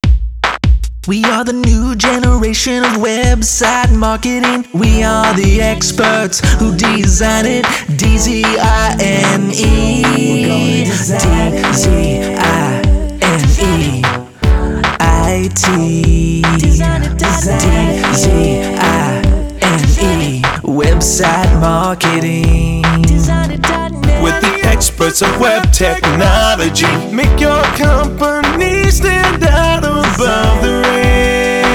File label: jingle; dzineit